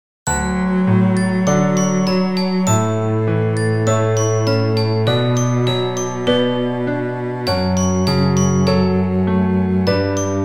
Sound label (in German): Pachelbel 3 Instr.